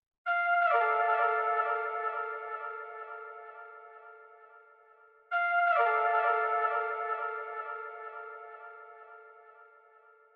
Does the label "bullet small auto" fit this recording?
No